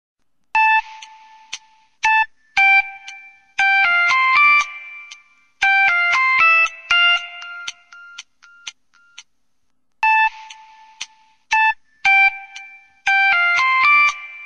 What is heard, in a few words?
Samsung Tune Original Ringtone